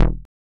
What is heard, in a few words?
bright electro bass pluck quick and punchy